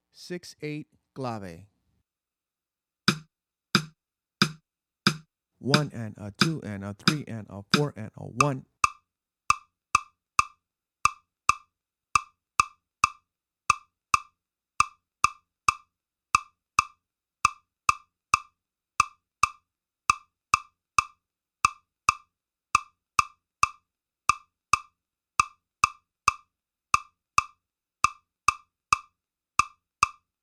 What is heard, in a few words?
6/8 Clave; bembe conversations